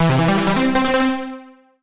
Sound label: Solo Warm Synth Positive; MUSIC EFFECT; startide